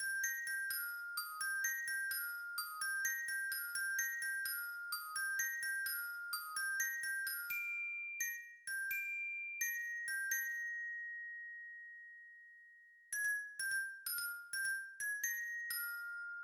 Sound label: mallet tryout